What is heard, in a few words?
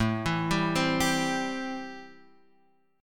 A-Minor 7th A; Am7 arpeggiated; JGuitar